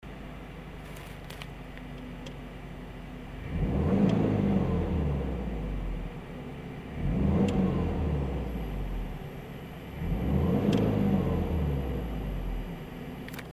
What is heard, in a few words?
3000rpm INSIDE; GDB